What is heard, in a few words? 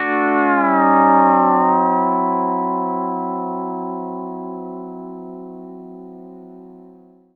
PEDSTL CHORD D9; Country; Sampleheads; Whole Lotta Country